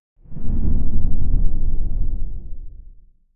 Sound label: trailer style low braaam; explosive burst